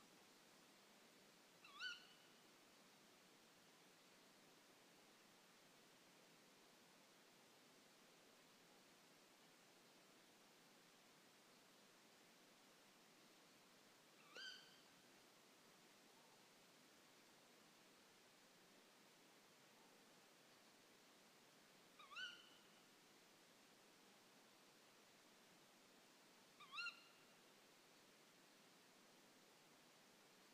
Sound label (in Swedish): konstigt ljud